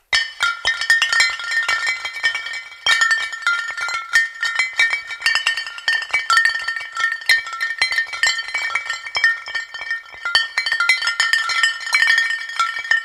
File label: Clinking sound of bouncing glass bottles hitting each other creating a musical; sound with reverb; Glass Sound Effects